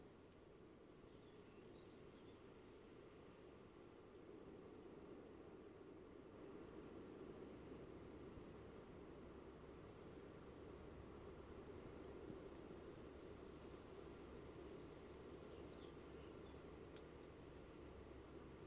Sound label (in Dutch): Grauwe Vliegenvanger Muscicapa striata